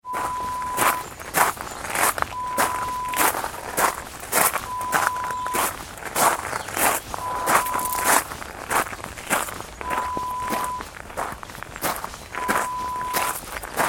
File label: Footsteps gravel